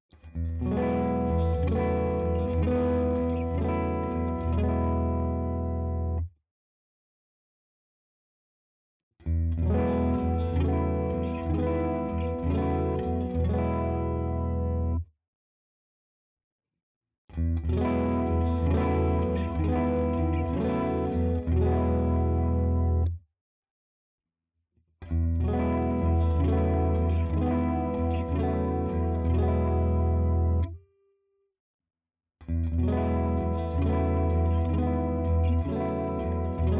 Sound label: Axis Flanger clean all on 12 Speed 5 Settings